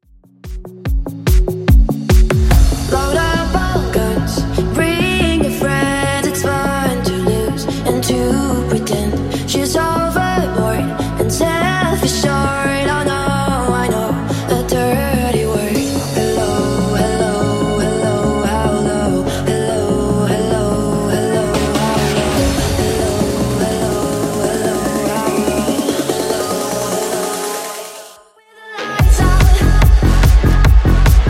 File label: House DJ Friendly Edit 145bpm